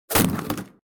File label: break